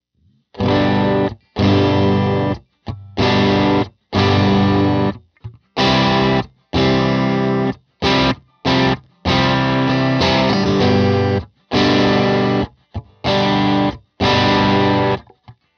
sweet spot dirt

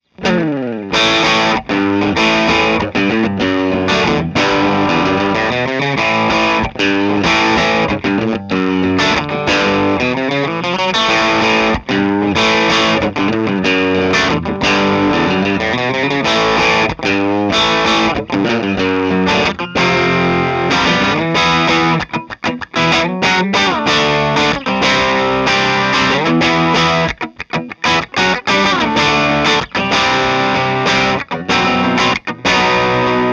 Bridge Light Dirt, pickups

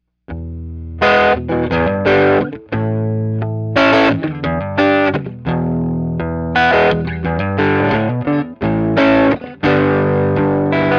J90 Neck Dirty, pickups

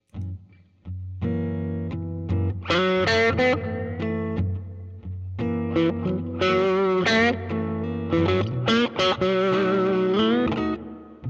Dirty Middle Am Std Tele

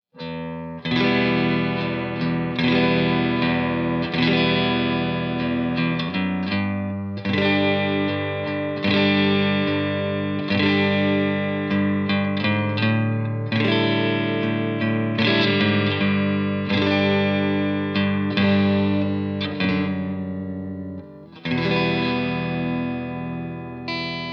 new tele neck

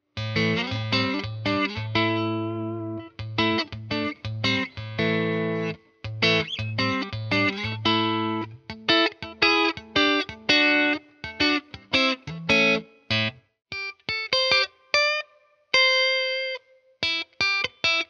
Neck Clean, Tele

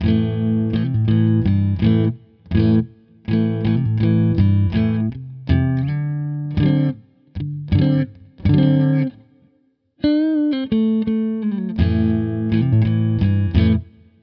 Neck Full Clean, pickups

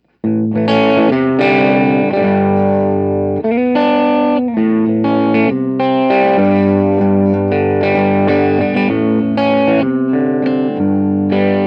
Middle Position, custom pickups

